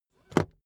car seatbelt buckle hit

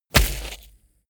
body fall sand heavy; krieg-waffen; nahkampf; soundpool

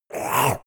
monster attack gurgle; koerpergeraeusche; comedy-cartoon; soundpool

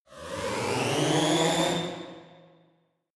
fake orchestra short; whooshes rises; risers

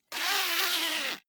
zipper pullover jacket close

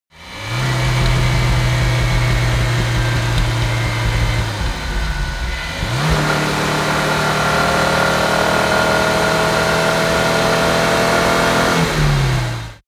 car dacia slow down high acceleration

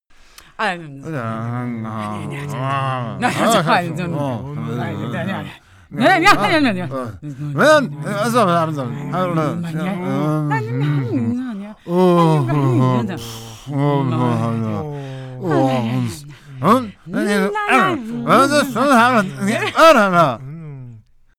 crowd grumpy walla

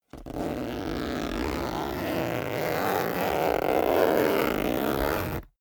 zipper down jacket close slow; objekte; reissverschluss

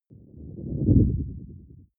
whoosh storm wobble short; swooshes-swishes

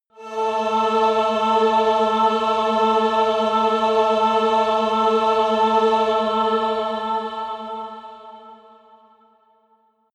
demon choir one note high

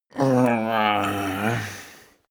male moan stretch; soundpool

